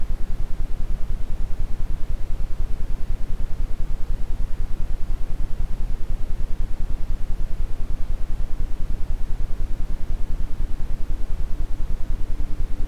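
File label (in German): Iso Gute Laune Motivation Quickie mit Ambient Sound, Isochrone Töne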